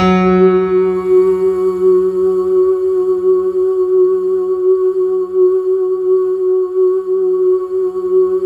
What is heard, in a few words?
F#3, Synth Line; 90 s Sample CDs; NiceBeats; SynthLine VOL-22